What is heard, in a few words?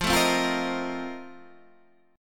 F 7th Add 11th F; F7add11 strummed